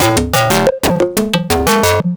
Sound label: FM Dirt Loop; Polyend; Stazma & Concrete Collage